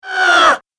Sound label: Scream Witch; Screaming Sound Effects